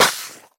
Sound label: spew, infestation, bulb